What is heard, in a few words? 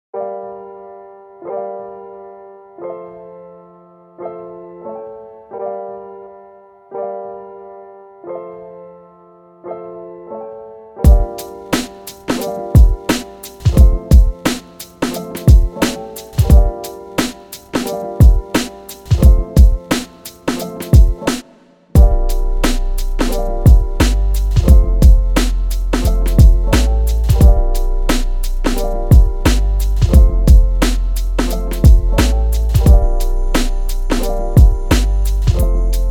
Instrumental